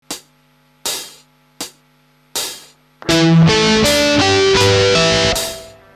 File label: n harm, natural harmonics